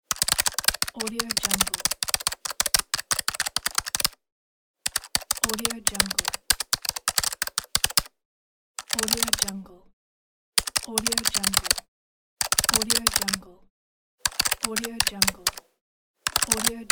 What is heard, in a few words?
laptop keyboard